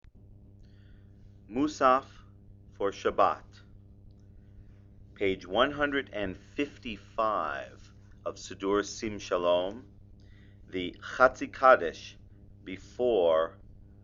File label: musaf chatsi kaddish; shabbat